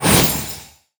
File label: MagicalShot; Remulia